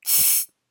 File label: open hi hat beatbox; hi-hats